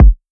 Kick Balanced, 808; 808 Kicks; Slate; Vintage Drum Machines Volume 2 808 Edition

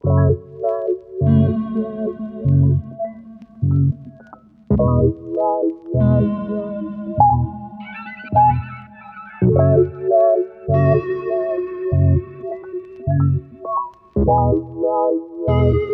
songstarter 90 F#m, Pop; Slate; PASADENA Pop Sample Pack; Songstarters

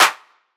clap disco; Drum One Shots; SD MIDNIGHT Sample Pack; Slate; Claps

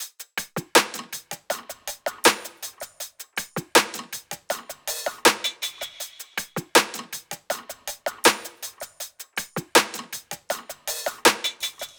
top loop 80; Pop; Drums; Loops; Slate; SD PASADENA Pop Sample Pack

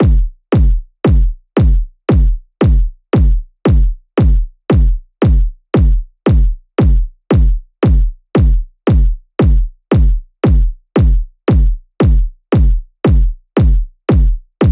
kick 115, Kick Loops; Drum Sample Pack; SD SEISMIC; Slate

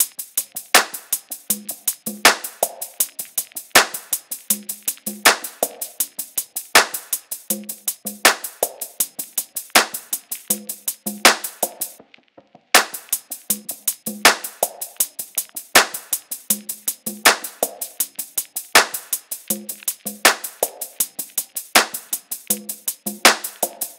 tops 80, Drum Sample Pack Loops Top Loops; SD SEISMIC; Slate

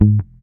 Bass one shot Muted Ab, Electric Bass; Slate Digital BASSHOLE Sample Pack

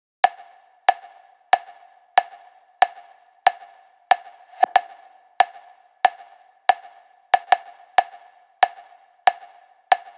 perc loop 93, Pop, Drums; Loops; Slate; SD PASADENA Pop Sample Pack

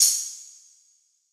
cymbal tambo; Hip-Hop; Drum One Shots; SD CAICOS Hip-Hop Sample Pack; Slate

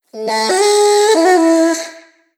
Vocal Sample Pack Phrases A; Slate Digital Omnivox Vocal Sample Pack

